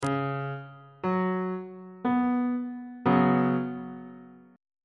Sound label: b suspended 2nd 1st inversion on bass clef